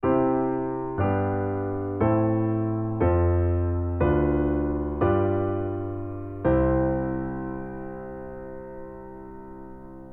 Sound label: Common Progression